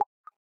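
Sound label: Pen detach notification; Samsung Galaxy S5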